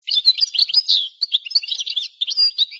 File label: goldfinch